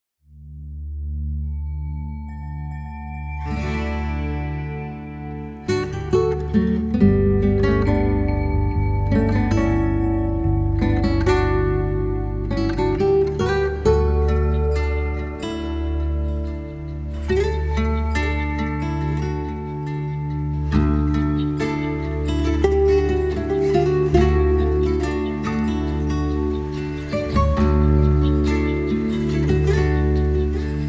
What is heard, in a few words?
Healing Music, Pink Skies Over Waves